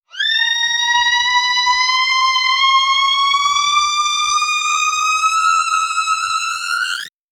bass boosted screaming

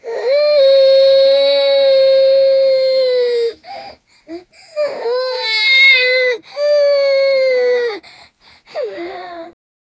a 10 year boy crying

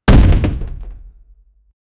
a man crashes into a, pain

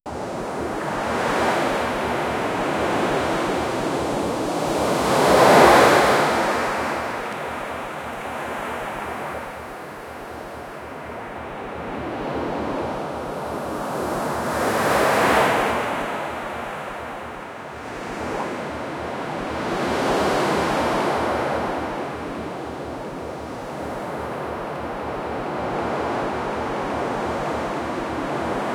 waves of the sea with, sea breeze

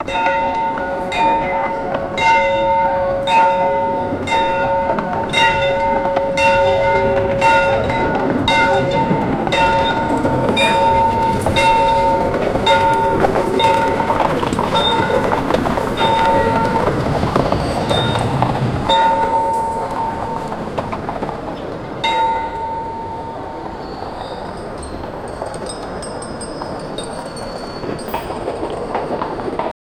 victorian london soundsca; early morning

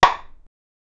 game puzzle hammer hit; tools

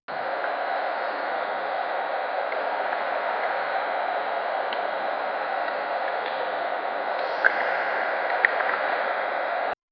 palace ambience echoing

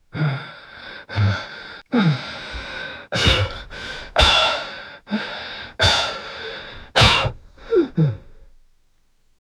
very heavy breathing, exercise

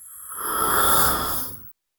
sudden high pitched spect, intake

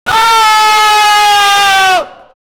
a man crashes into a, pain

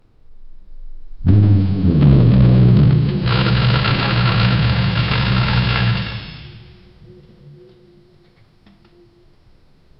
heavy bull breaths

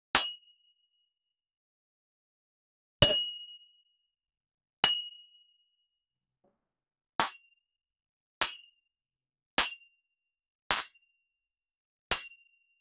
ball lightning hitting

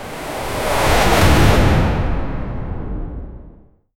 high speed flight sound

